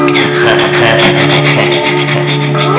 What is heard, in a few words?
crazy laughter; People